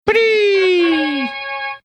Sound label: making fanfare sound